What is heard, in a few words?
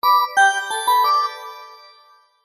multimedia alert notification bright organ bell like musical positive